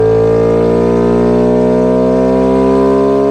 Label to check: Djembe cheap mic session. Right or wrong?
Wrong